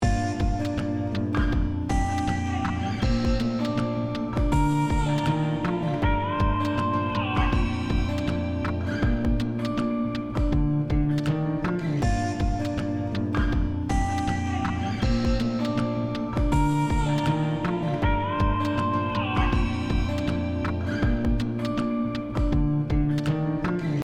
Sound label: volt all comp fast